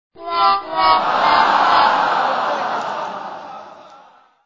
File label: sfx audience groans wonk wonk wonk wonk